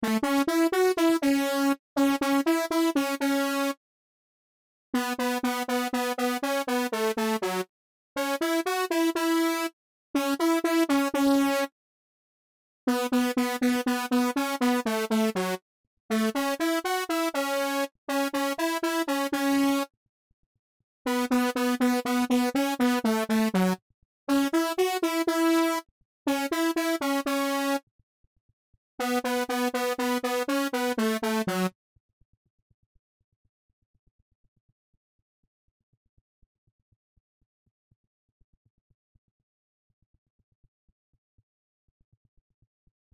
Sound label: Synth